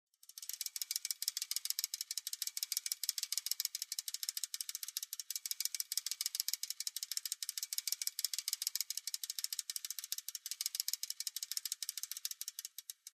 velosipednaja treschetka

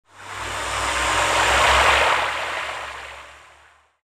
zapusk bystroj torpedy

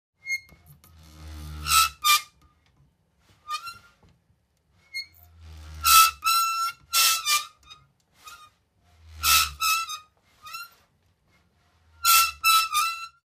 vraschenie metalla so skripom

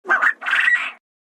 zvuk krika perepela

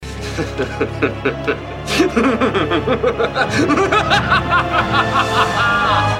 zlobnyj muzhskoj smeh

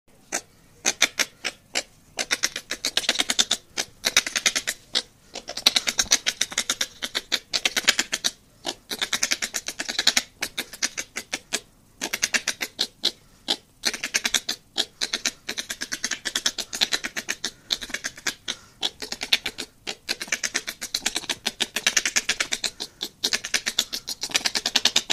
schekotka surikata

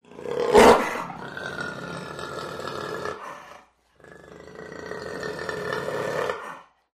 zvuki jaguara

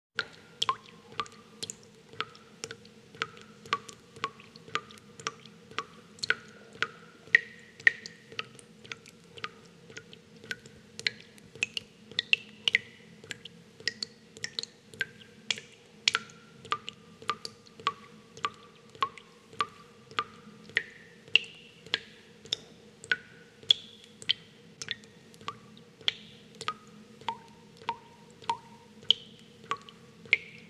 zvuk protekajuschego krana

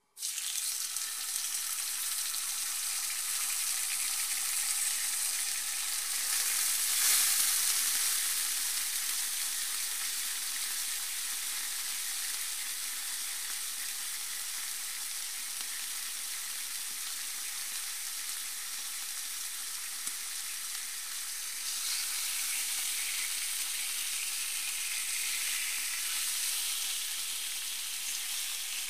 zharjat bekon

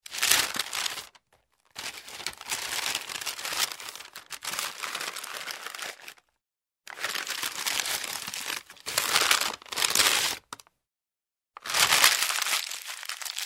zvuk bumazhnogo paketa

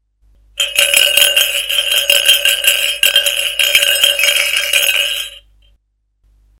lozhka v stakane

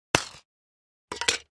udar po mjachu v golfe

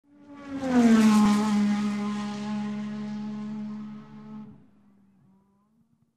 finish v formule 1

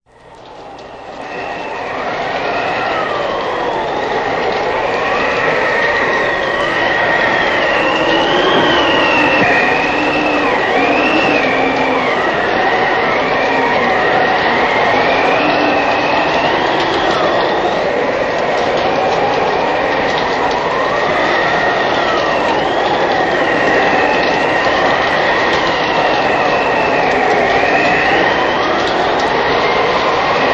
burja s dozhdem

